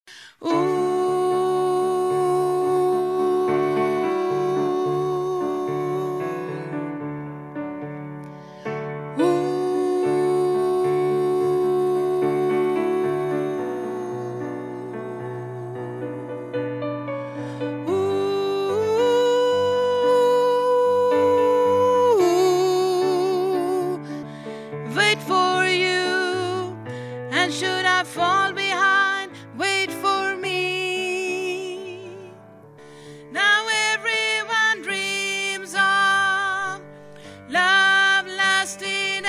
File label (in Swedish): bas, stammor